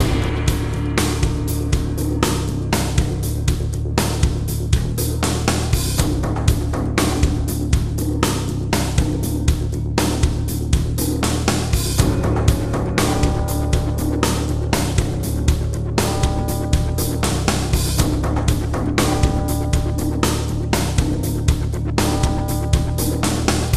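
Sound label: ACTIVATING TRYP FULL NO GUITAR, soundtrack; transformers war for cybertron gamerip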